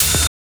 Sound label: Hat; OrganicTopLoopsMini; Touch Loops